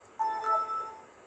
windows vista log on

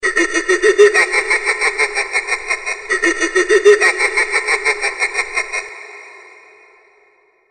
evil laugh

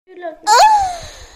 moaaaaaan

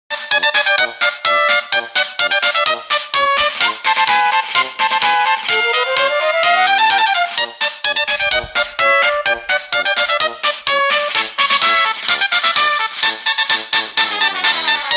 circus

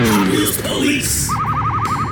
police; hot wheels

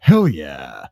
hey all yah

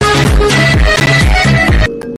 intense disco

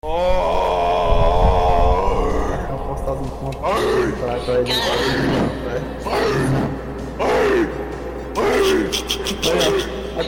battlecry

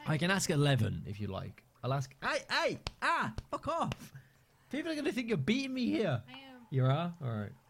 beatings

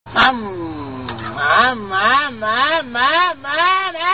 ammmmmmmmmmmm

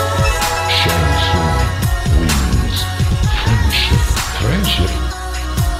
friendship trap

